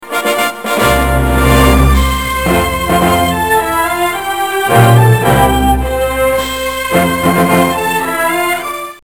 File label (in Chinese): 片头音乐